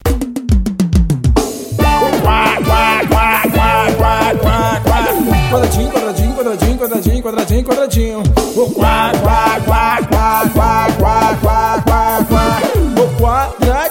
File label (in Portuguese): arrocha